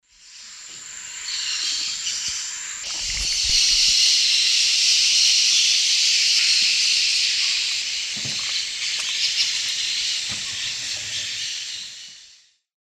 Brotogeris cyanoptera, calls huge flock; S. America; Eastern Lowlands

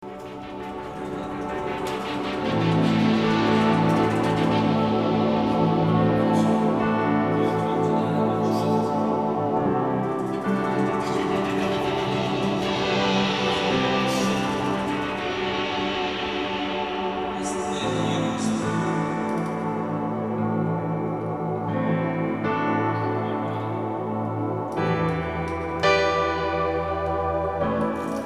Instrumental Bridge

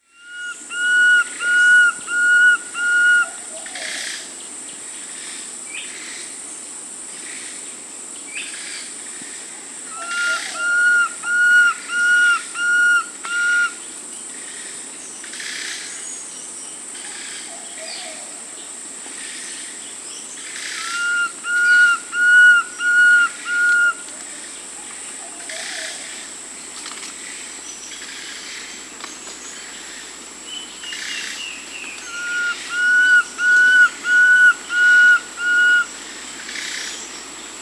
Fasciated Antshrike (Cymbilaimus lineatus); Peru; S. America

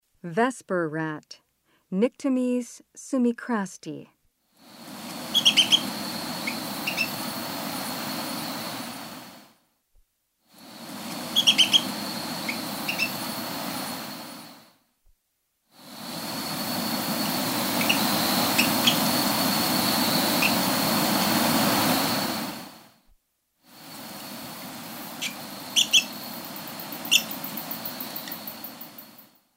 Vesper Rat; S. America

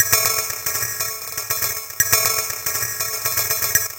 Beat; Sound Effect Library; Electronica; Concrete Beats

